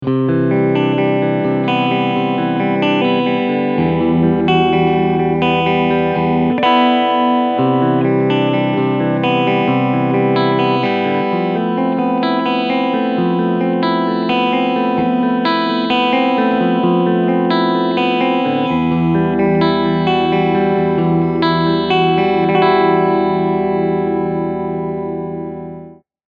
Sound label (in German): Beide PUs SC Modus Clean